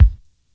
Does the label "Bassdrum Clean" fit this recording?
Yes